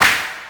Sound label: whip